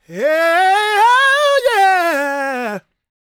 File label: GOSP MALE, Vocal Planet Gospel